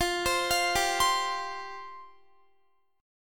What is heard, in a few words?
F Suspended 2nd F down Guitar; Fsus2; arpeggiated; 4E,4A,5D,5G,5C,6F